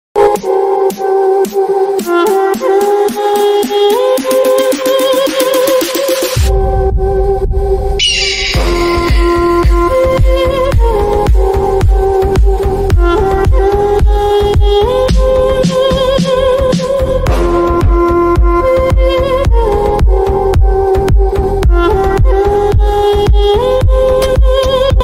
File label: eagle sound ringtone hawks ringtone eagle bgm ringtone; egale